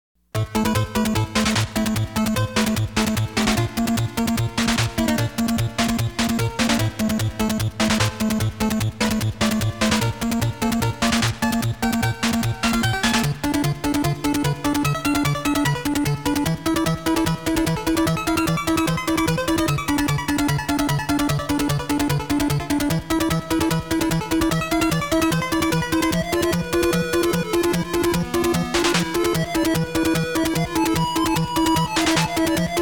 Field BGM

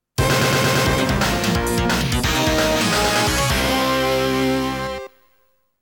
Game Over

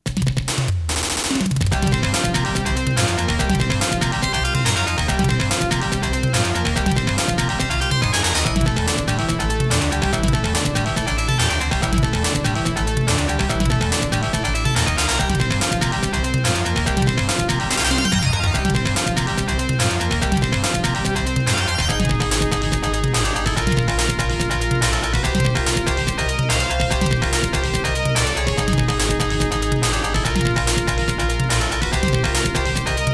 Stage BGM

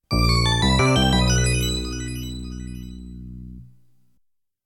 Stage Clear

